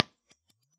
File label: Science 4x12 V30 SM57; IRs